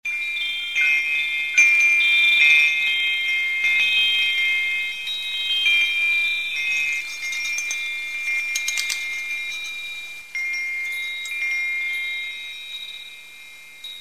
Fairy Chimes; Dragon Lore

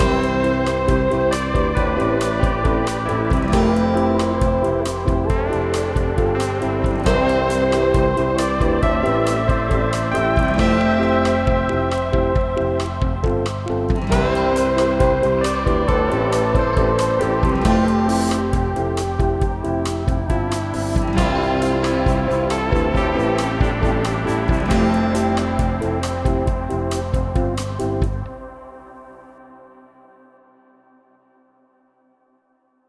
bossanova outro fail; Summer Catchers

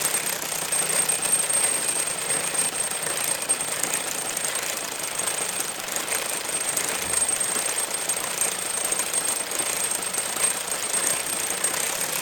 jackhammer no contact; teardown; ps5 windows xbox series xs

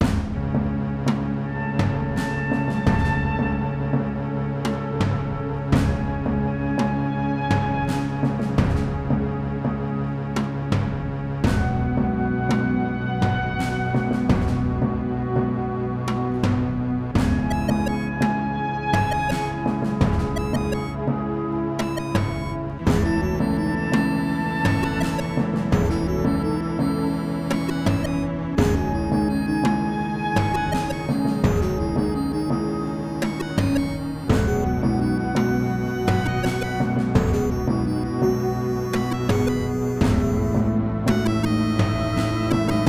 Town Music Loop

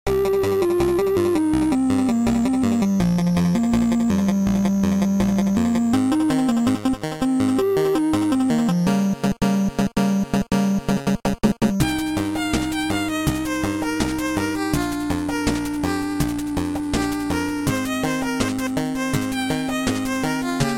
bgm hell lounge

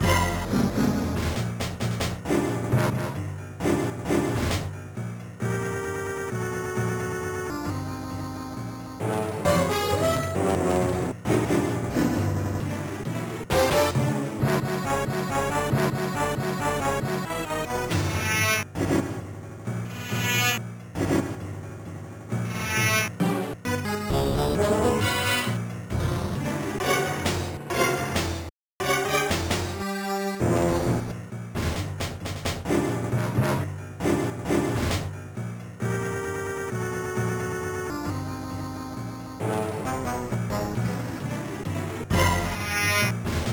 Tension; 007 Nightfire; GBA

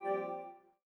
Enter Menu

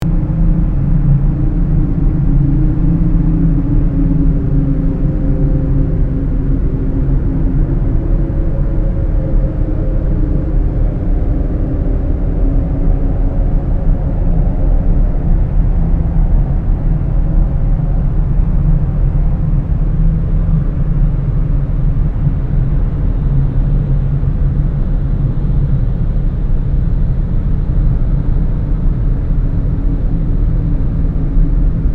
Amb Lrg Atrium

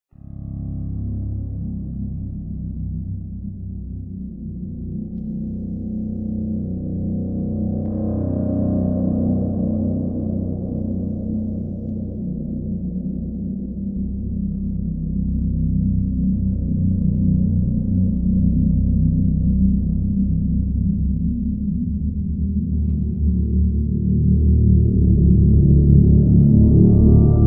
lo Bass; mus TreeSeq; perception

